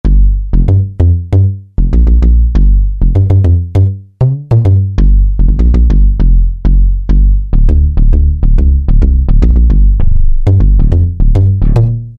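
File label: Bass Soul, Yamaha TG500; Internal Voice I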